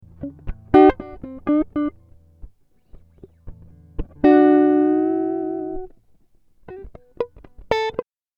Am60 GTR impro

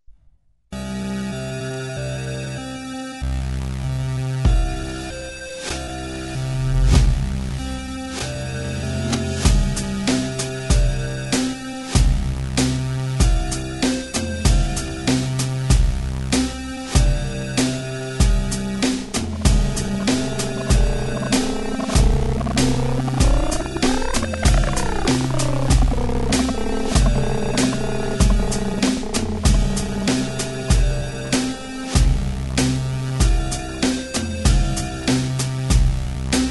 Incomplete Thunderstorm Stems

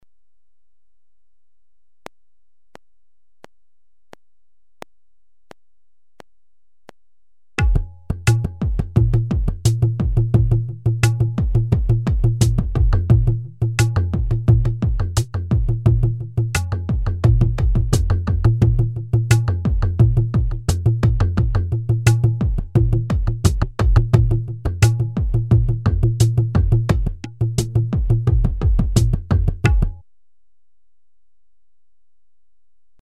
DRUMS

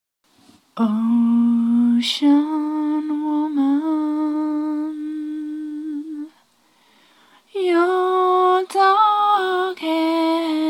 Ocean Woman Sung

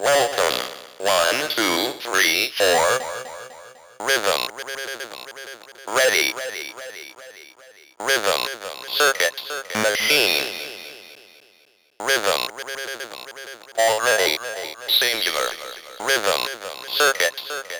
Electronic

